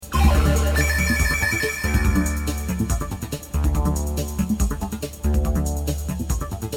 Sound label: ringtone